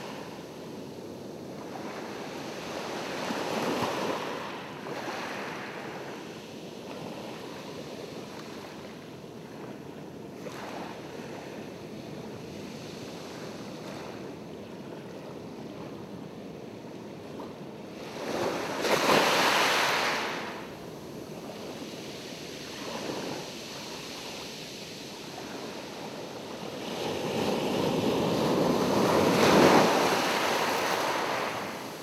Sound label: Beach Waves